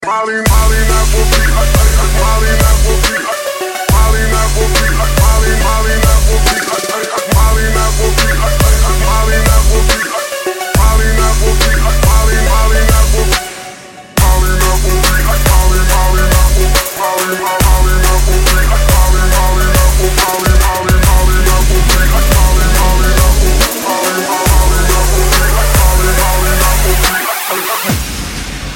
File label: Trap